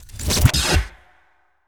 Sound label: Transition; FREE SFX PACK VOL 3